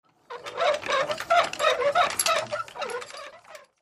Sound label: Pulley Squeaky Whee, carts